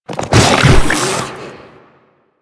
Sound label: Infested Ancient Strike Flesh; Warframe